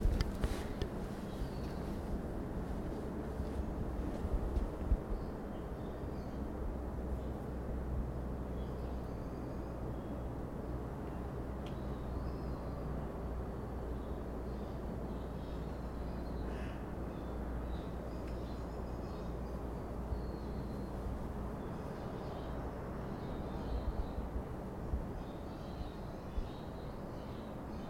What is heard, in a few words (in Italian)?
suoni vicolo; borgo sacco